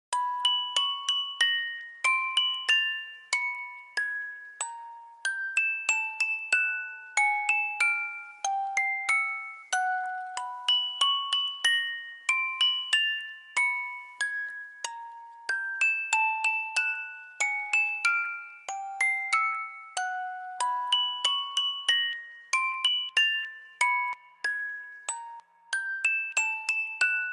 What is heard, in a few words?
Early Room Clear Theme